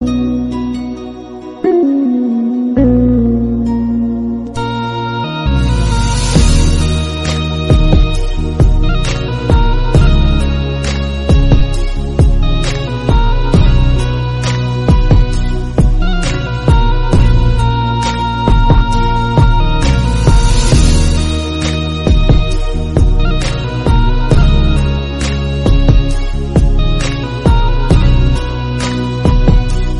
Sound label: sad music instrumental song